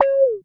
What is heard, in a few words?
Dance Mega Synth; Best Service; 90 s Sample CDs